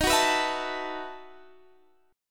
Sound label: B 11th D sharp, chord